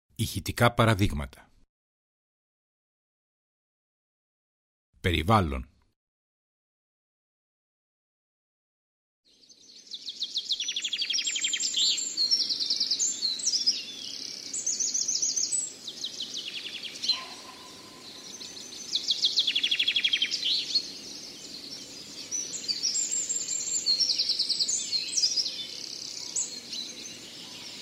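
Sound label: forest sound; Hxos Dasoys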